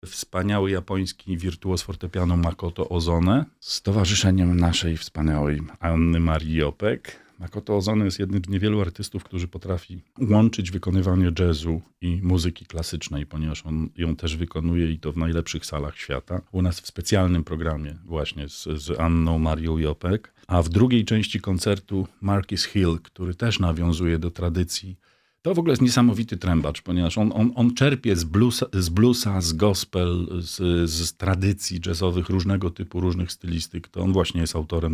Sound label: jazz; 61 edycja jazzu nad odra